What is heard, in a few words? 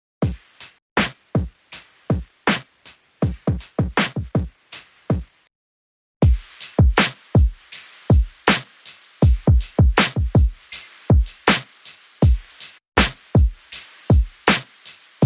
Drums Only